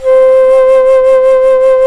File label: FLT, Bass Flute, BsFlt vib; Roland LCDP04 Orchestral Winds; 90 s Sample CDs